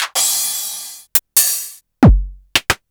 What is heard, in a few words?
Korg Electribe ER-1 Kit; Sliced